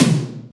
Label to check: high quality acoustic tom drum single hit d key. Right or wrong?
Right